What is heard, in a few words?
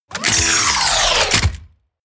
wind down